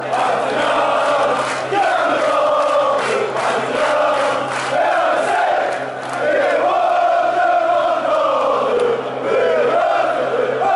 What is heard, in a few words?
song deurne noord antwerp